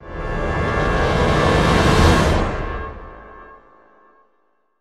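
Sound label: sting, horns; transformers war for cybertron gamerip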